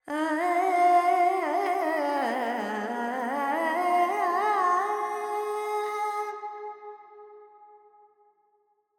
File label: Verb Dorian, Vocals, Carnatic Female, Alaps, 70 G; Sample Banks